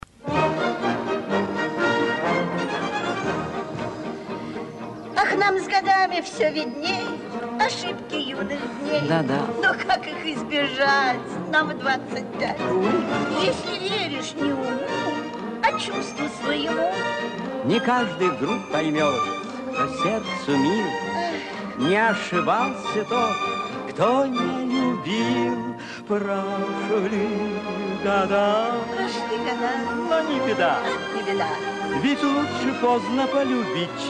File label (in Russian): duet